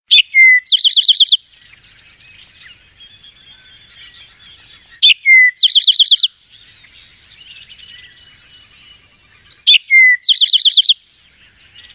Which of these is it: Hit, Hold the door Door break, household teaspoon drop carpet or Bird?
Bird